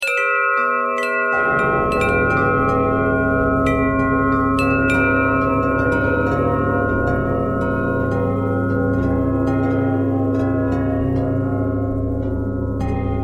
bell bell thread pentatonic, bells

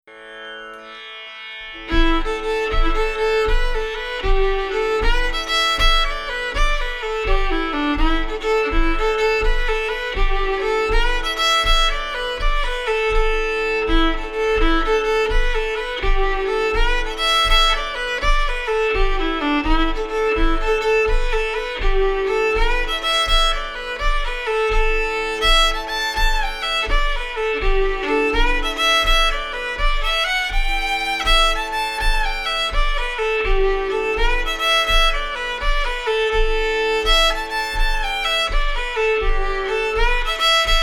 Lilting Banshee, fiddle; performance

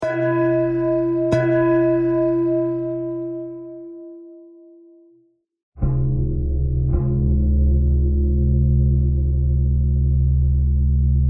bell thread pentatonic

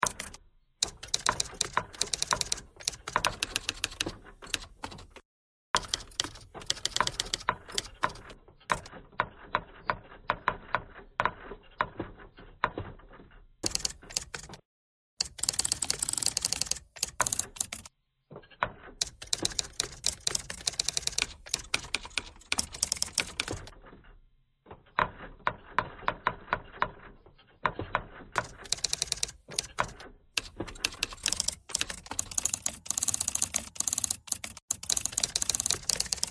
typewriter thread pentatonic

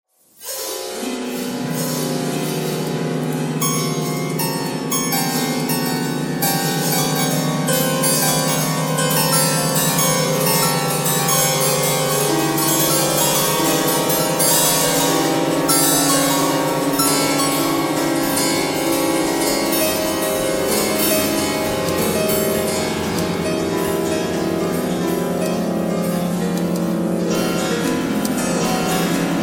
piano thread noise all

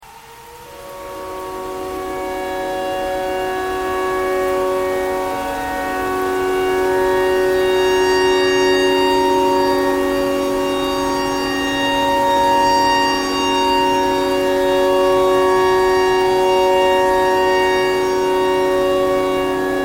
tornado siren thread pentatonic

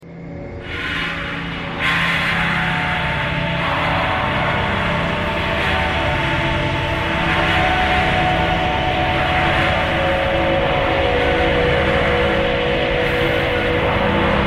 thread pentatonic

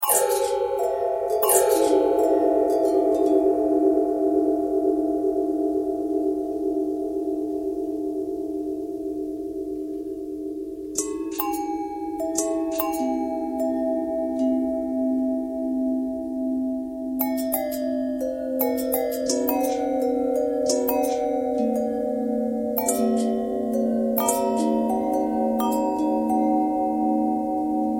long bell long bell bend twist, bells

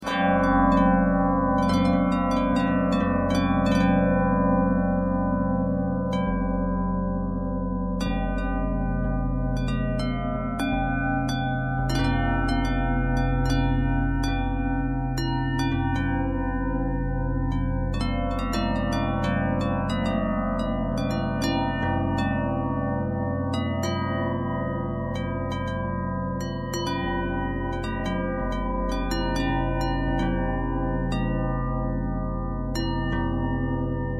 bell twist; bells

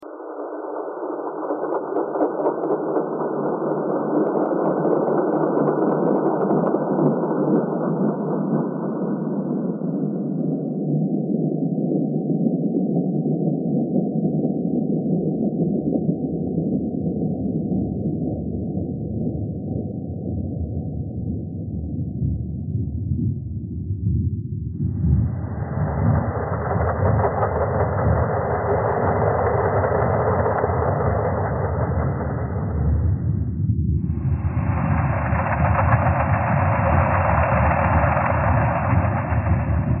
thread harmonic

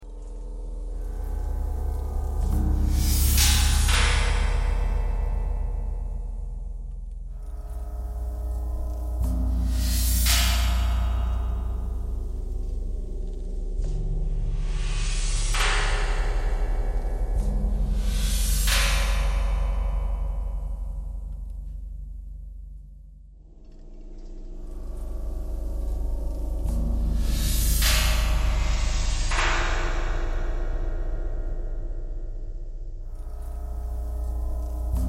knocking thread noise

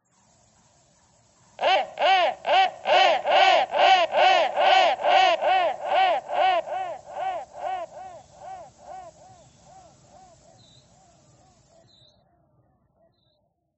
crow thread noise

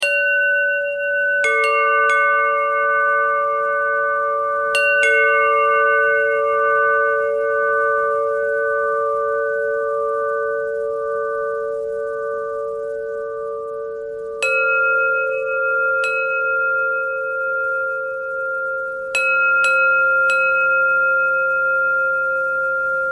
bell buzz

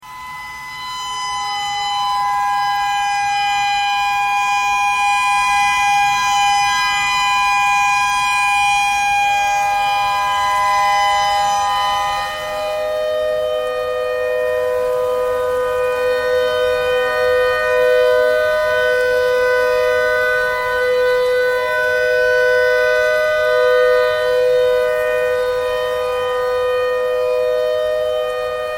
clarinet notes e tornado siren thread noise

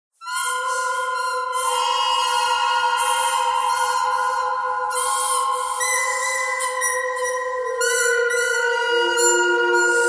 bird birdcanyon thread pentatonic all; birds

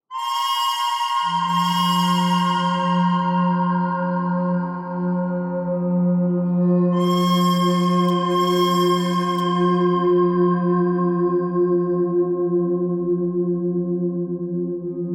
bird thread simple

